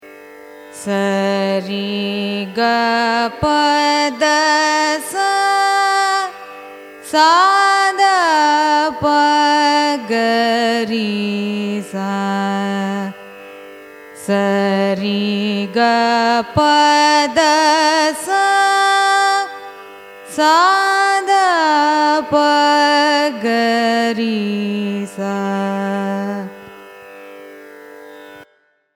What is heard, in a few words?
raga bhoopalam arohanam avarohanam; carnatic music